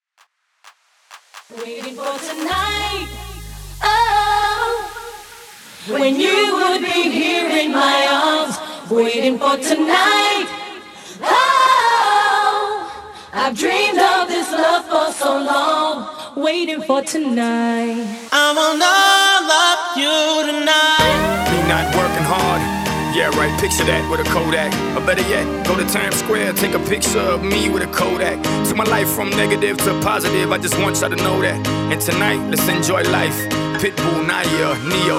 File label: Clap Starter Acap Out Mashup Clean 129